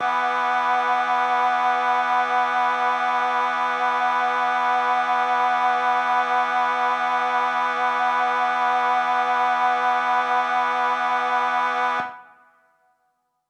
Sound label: Mixed Winds E7:9, synth orchestra